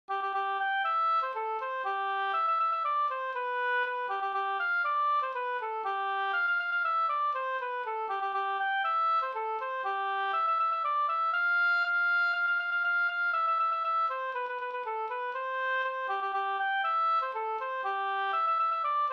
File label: polka